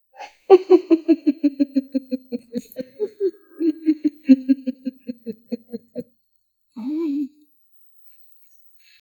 quiet mischievous cartoon; indoor ambience

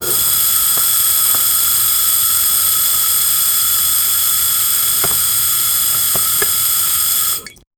quick steam burst

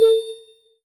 ethereal chime of healing

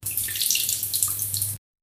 indoor

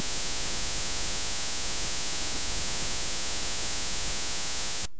isolated subtle presence, creaks

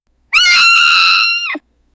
screaming, abrupt; mickey mouse

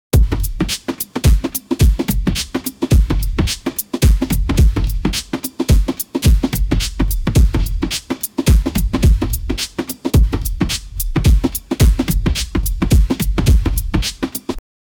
sounds of future drum and, hip hop

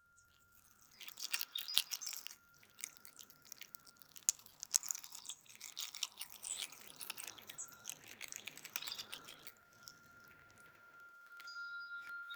uplifting chime birdsong

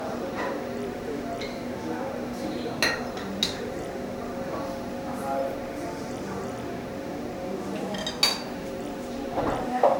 seamless looping restaura

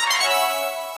triumphant stinger short

